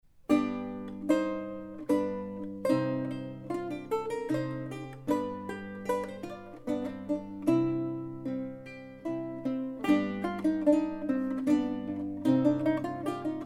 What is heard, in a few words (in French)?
treble luth